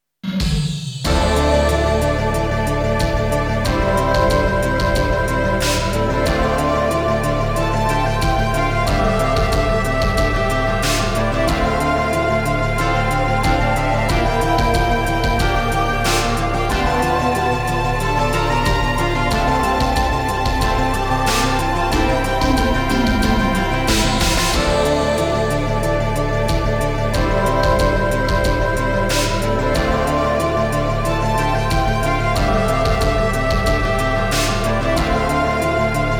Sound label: Continue Music; Namco Game Sound Express Vol.3; finest hour